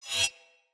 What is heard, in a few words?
Dagger Heavy Whoosh Wind Up Accent; Weapons; Player; Swords; Fey